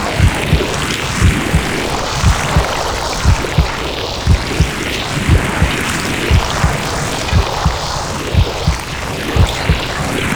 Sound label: Vamp Aura Loop; Gameplay; Warframe; NineteeenNinetyNine; PvPvE